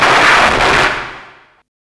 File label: CYBERGUN L, Hard & Loud Techno; USB Soundscan vol.01; AKAI